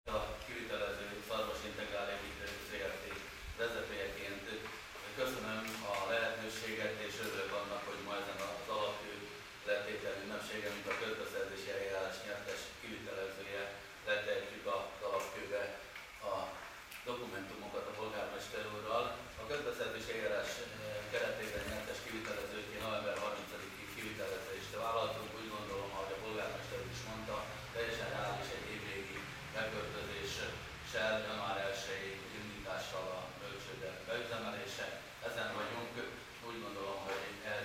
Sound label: digitar